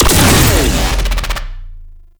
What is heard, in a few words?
Last Shot, Infested Arm Cannon, Weapons; Warframe; Coda Bubonico